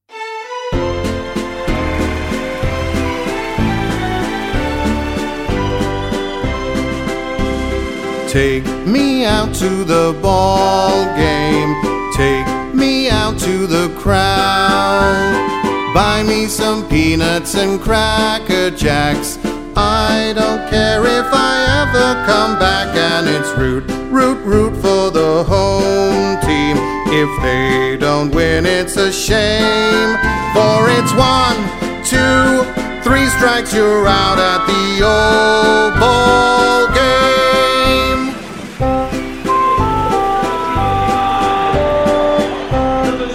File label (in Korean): 영국남 동요; 남자